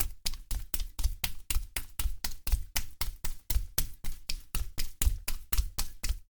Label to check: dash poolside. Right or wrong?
Right